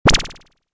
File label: Resonating Hit; Tech Sound Effects; Tech (Sound Effects:Science Fiction)